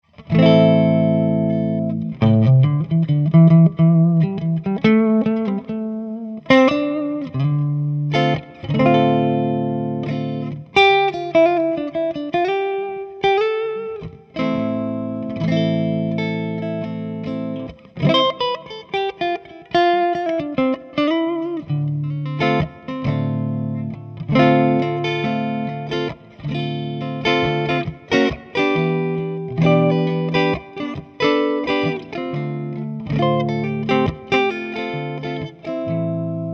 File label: tele neck clean, pickups